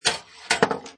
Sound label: Pinball Sound Ball Lands On Rail Rolls And Drops; Video Game Sound Effects